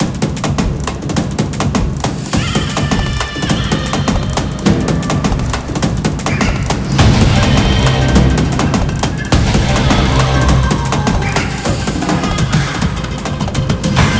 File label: freespin music; conan